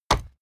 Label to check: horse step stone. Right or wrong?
Right